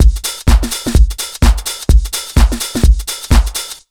127 BEAT, Disco House

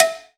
COWBELL A

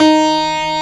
STUDY PNO; East West; Global Sounds

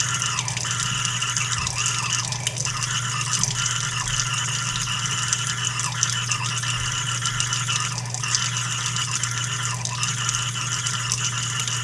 WATER; Rarefaction; Poke in The Ear With A Sharp Stick

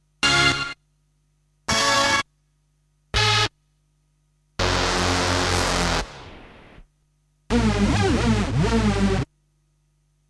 Stabs; Kniteforce; 1000 Samples Volume 1

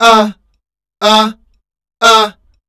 WEIRDOS, Voice Spectral

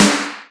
Snare, retro house samples, Drum Hits

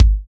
BD, Roland; Sound & Vision - Gigapack I

